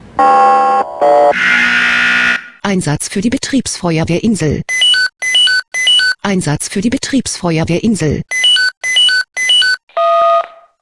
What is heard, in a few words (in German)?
SMS Alarmierungstone; BFW Insel